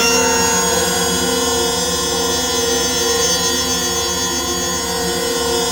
A#3 METAL; Northstar; The Wizard VOL-1

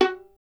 STR VLN, Solo Instruments; Miroslav Vitous

